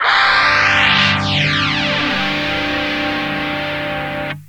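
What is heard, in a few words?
guitar hoax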